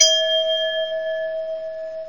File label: Elevator Bell; inner demons